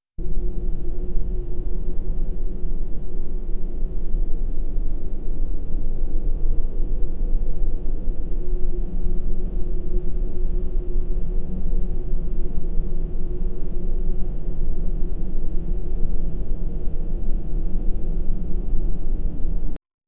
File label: generate an audio file th, silence